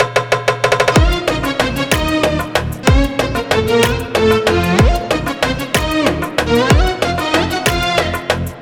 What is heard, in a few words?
solo